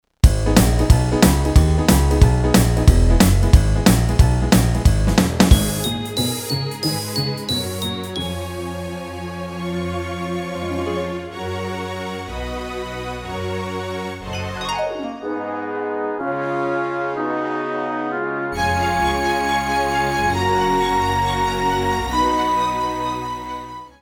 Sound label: Love Song; Moulin Rouge